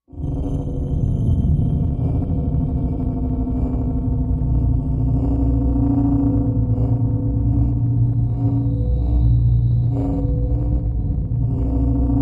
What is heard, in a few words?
Energy Source Low Pulsing Energy Production; power core hum